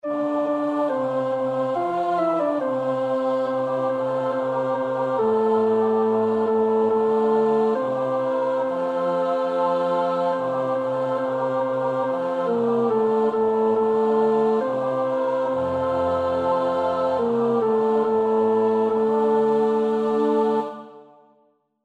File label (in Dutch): tenor